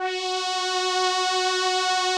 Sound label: SOLINA, String Machines; Best Service; XX Large Most Wanted 2